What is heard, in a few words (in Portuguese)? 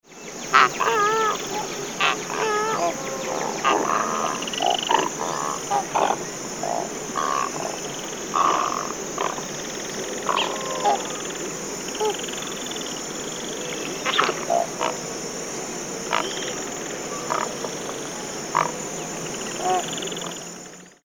garca branca grande